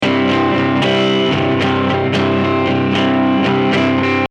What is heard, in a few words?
overdrive